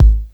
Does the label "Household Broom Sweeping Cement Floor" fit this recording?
No